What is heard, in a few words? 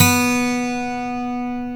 12 STRING B3, Acoustic Guitars; AKAI S6000 CD-ROM - Ultimate